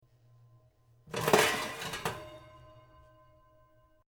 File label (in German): Herd Blech mit Nachhall